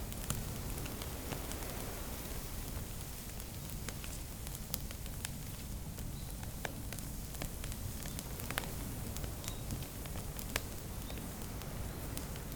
outdoor fire crackle; kama; anemoi

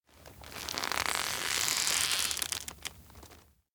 pinch cheek hard; anemoi

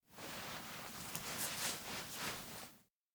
hand clench; anemoi

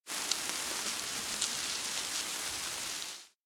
sparkle; anemoi

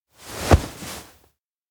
sofa sit; anemoi

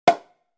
champagne cork pop; anemoi

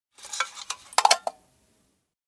oil lamp off; anemoi

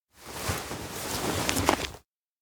take thick dictionary bag; koyomi; anemoi

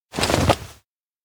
land; koyomi; anemoi

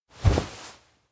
kigurumi hug; anemoi パッケージ版 1.1

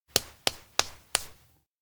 slap skin; anemoi

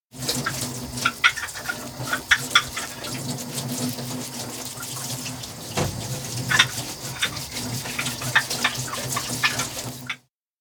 wash stack dishes wipe; anemoi

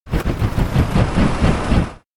elec plane engine stop; anemoi

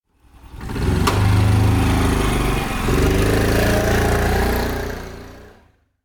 bike engine start; anemoi

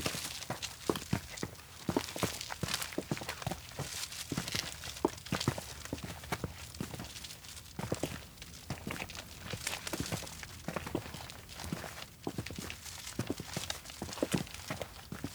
steps outdoor town walk 3 bags loop; awayuki; anemoi

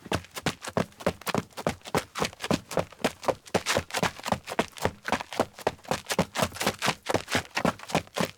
steps outdoor cliff run down; LOOP; chiharu; anemoi

